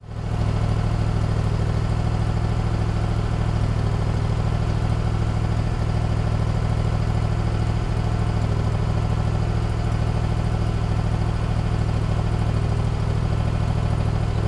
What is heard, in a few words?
industrial generator or desiel machine engine idle occasional workmen operating; occasional workmen operating beside; zapsplat